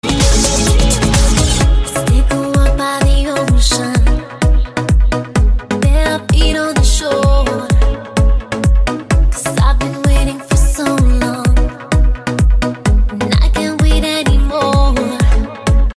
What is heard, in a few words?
latin moon; iphone7